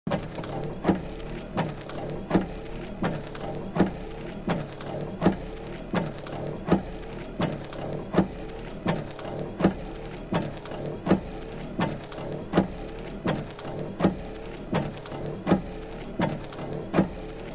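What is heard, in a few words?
Car windshield wipers sound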